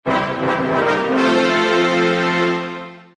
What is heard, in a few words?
fanfare regal